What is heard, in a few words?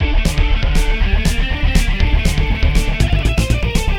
Combat Boss Intro